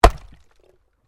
Wood Chopping Axe; Chopping Sound Effects; Objects

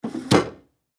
Foosball Table; Foosball Table Players Triple Move Hard

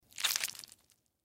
Surgery Incision Scalpel Squishes Guts; Hospital; Emergency

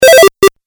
8 Bit Style Small Bonus; Video Game Sound Effects

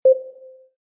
Menu SFX: Navigate, Button; Buttons (Sound Effects:Multimedia); Menu Move; Stuart Duffield

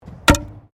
Archery Straw Target Impact; Various archery sounds; Miscellaneous Sports; SoundEffects-Foundation1

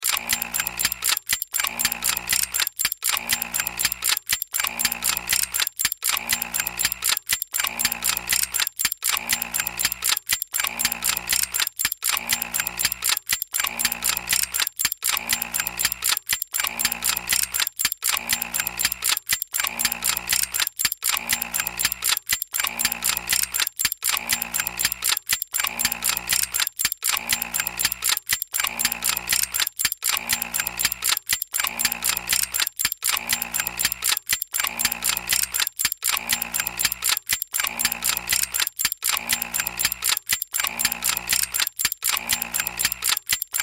Sci Fi Workshop Machines Mechanical Steampunk

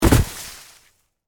Body Fall Snow; Horror; Blood And Guts Sound Effects

